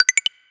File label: alarm